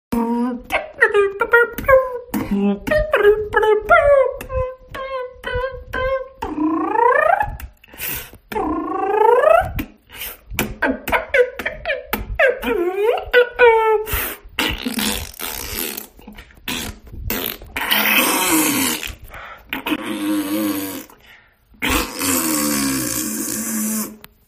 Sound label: beatbox; bimbimbim; about food